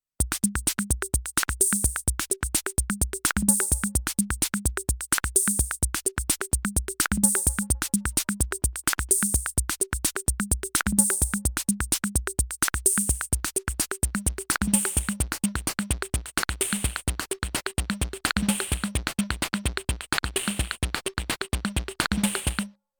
Roland TR 8S CR 78S

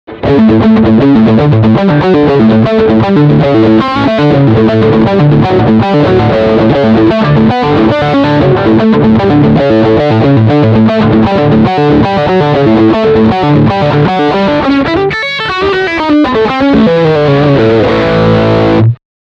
Riff Bridge Pickup

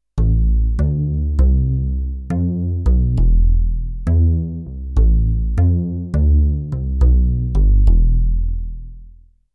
Octapad

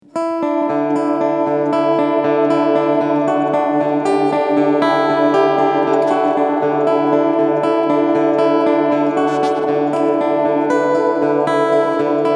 ME 3 Black Hole Effekt; LAVA MUSIC

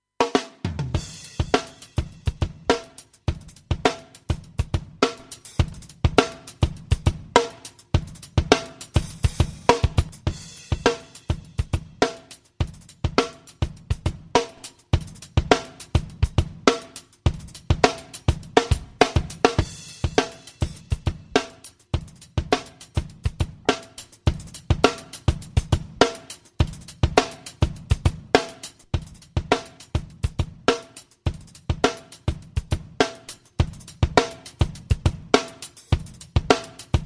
Drums; FMR Audio RNLA7239

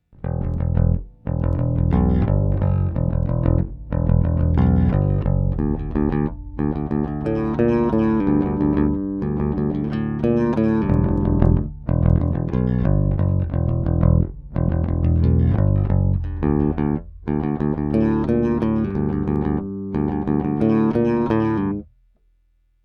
Hornet Bass Color

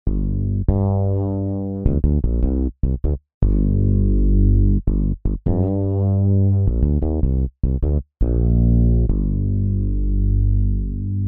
Doubled Fretless True Stacc A

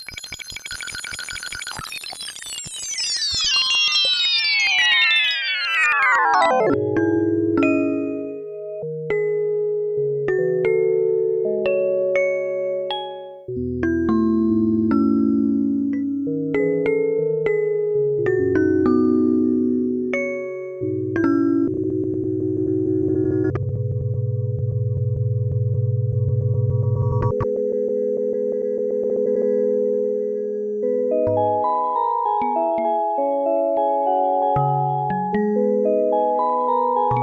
Bell Live Tweaking; Sonicware Texture Lab

